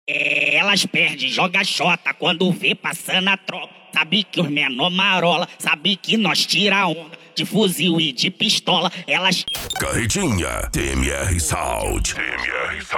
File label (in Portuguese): esp insane sound